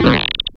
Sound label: SYN FX; X-Static Goldmine 1